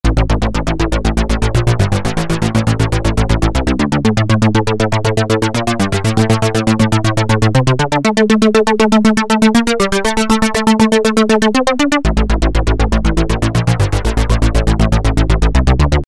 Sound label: synth